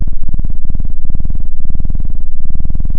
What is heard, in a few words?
pop, Sound of a cat purring